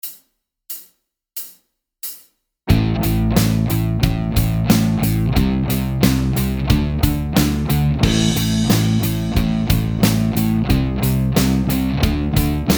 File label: A minor, guitar; total guitar; play guitar now